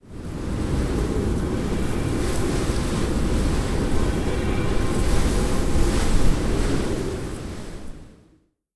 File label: lobby wind; smite; tiamat